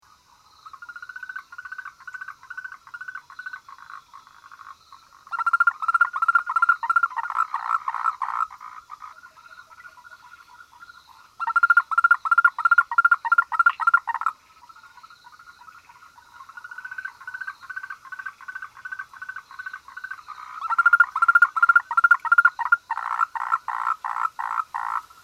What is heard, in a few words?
Rhacophorus Owstoni Is Calling